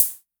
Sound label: Hi Hats Open; LIMAT Div Dept